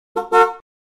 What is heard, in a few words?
car horn short; butterflysoup2